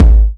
BD

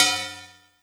Ride Cymbal

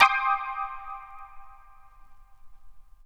DX200 House Hi Vinyl Synths Cmin9 C4; vinyl chords

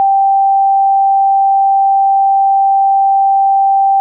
Clean Sine DX100 G4

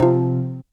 Sub37 Pluck Duo Vinyl Synths F2; vinyl chords

